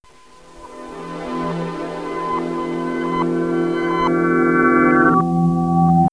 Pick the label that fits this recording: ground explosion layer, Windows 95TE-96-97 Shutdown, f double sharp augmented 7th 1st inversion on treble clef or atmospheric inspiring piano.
Windows 95TE-96-97 Shutdown